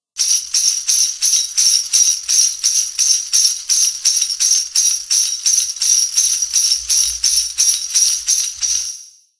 janczary; Nagrania Lokomotywy; edukacja wczesnoszkolna

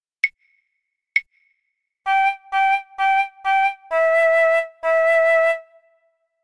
flet poprzeczny; dyktando; Nagrania Lokomotywy

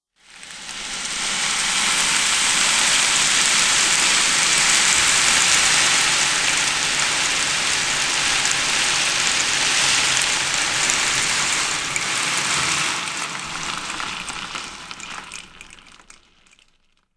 kij deszczowy; Nagrania Lokomotywy; edukacja wczesnoszkolna

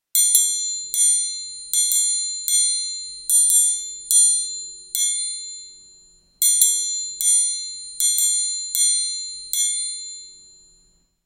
trojkat; Nagrania Lokomotywy; edukacja wczesnoszkolna

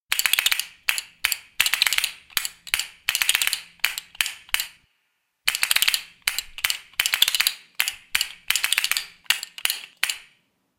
kastaniety; Nagrania Lokomotywy; edukacja wczesnoszkolna

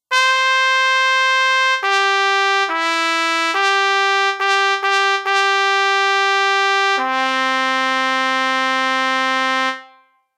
trabka; Trąbka, melodia 1; Nagrania Lokomotywy; edukacja wczesnoszkolna

